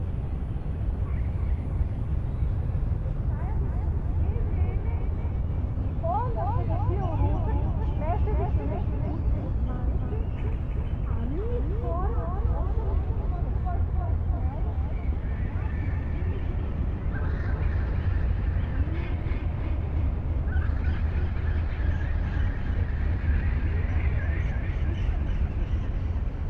Bats

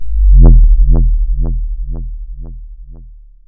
Thump wave

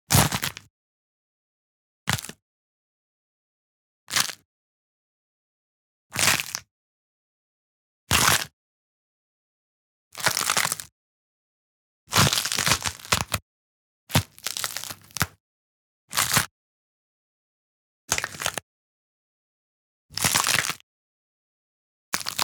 Bone Breaks Crunches

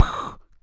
Pow

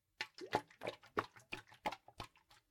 Paint can shake slow

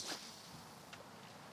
Fishing Rod Cast Swoosh

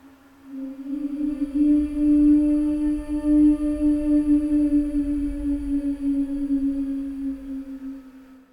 Ghost

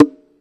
Bongo

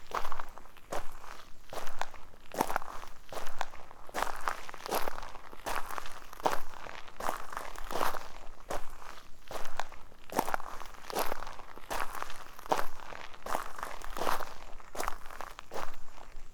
Footsteps On A Gravel Road

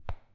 Punching

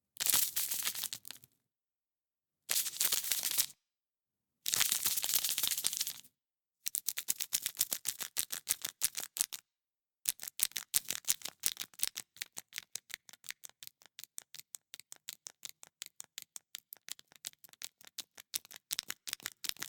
Sugar Packet

